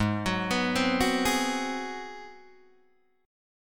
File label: Ab 7th Sharp 9th Guitar Open D, chord